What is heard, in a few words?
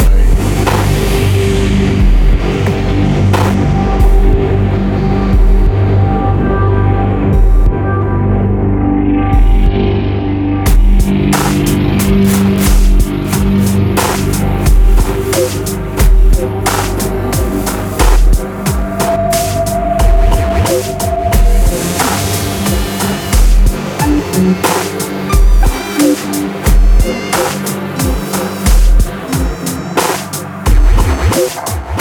BGM caves; PS Vita